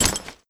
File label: Orc Clothes